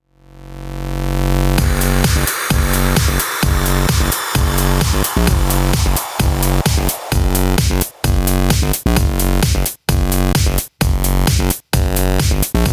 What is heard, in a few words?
Electro ring